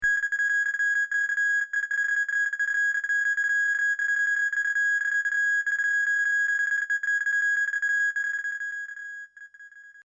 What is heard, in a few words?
SciFi Scanner; Communication Sound Effects